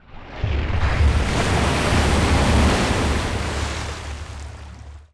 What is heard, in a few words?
SE LUNGE sea monster; Giants